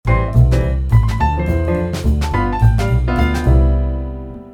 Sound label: C4 tritone sub